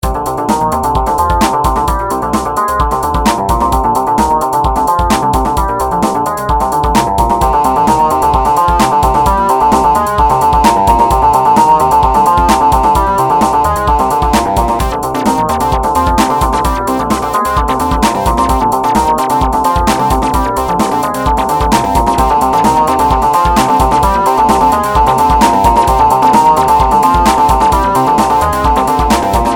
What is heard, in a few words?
synth stereo; Battle Theme